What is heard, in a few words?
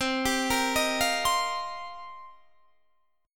C Minor 11th C Guitar DADGAD; Cm11 arpeggiated; 22,22,20,20,20,22